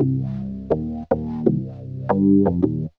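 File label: synth bass, drum and bass, 160 bpm, C; eJay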